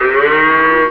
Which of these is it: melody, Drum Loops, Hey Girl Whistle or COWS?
COWS